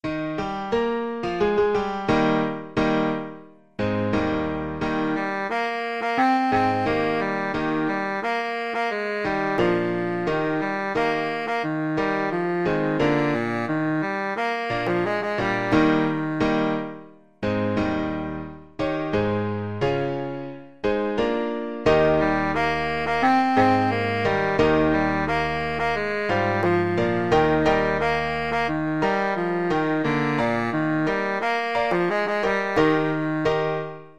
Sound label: baritone saxophone